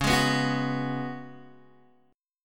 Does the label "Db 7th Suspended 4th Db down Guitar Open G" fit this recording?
Yes